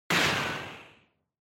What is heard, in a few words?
Censor Bleep Gun